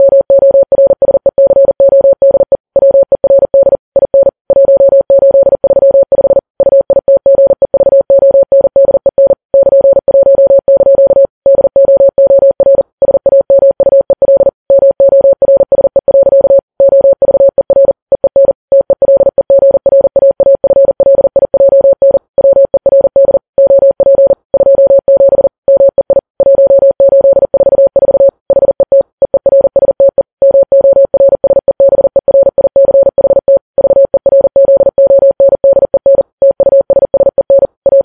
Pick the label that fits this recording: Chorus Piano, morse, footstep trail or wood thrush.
morse